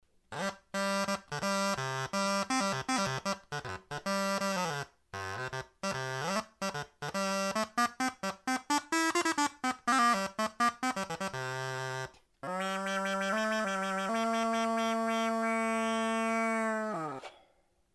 Tenor Crumhorn

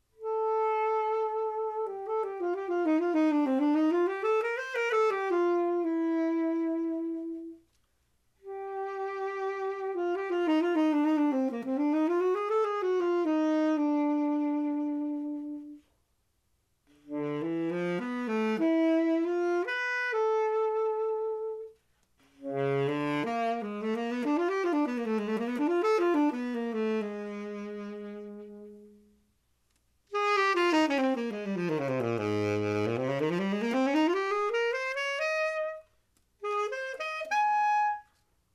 Bb tenor

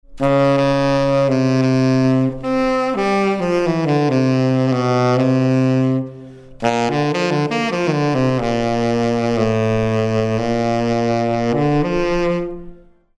Sax Tenor Shrine

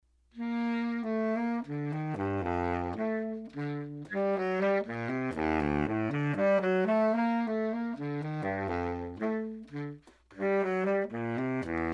baritone

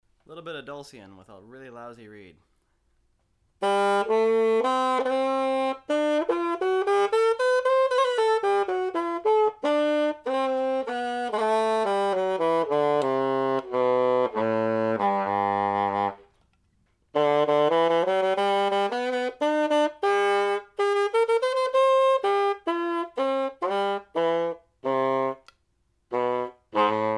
dulcians

